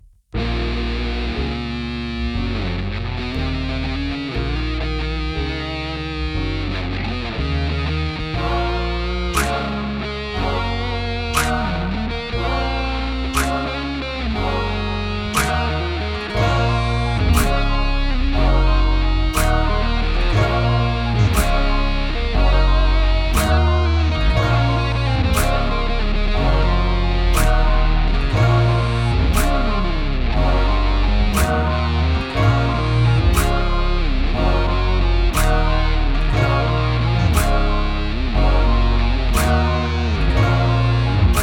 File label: COVER 90bpm lofi